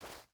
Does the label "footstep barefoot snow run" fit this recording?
Yes